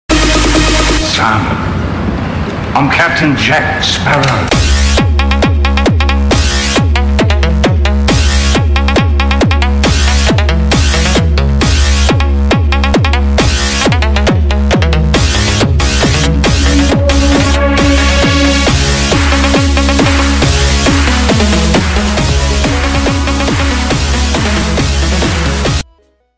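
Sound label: Instrumental music ringtone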